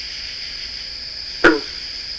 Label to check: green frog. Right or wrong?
Right